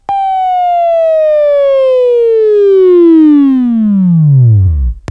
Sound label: Buzz; Labview Program; Sweeping Training program